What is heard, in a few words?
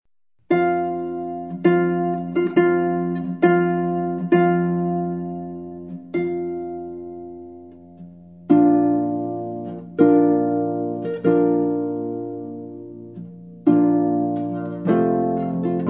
Solo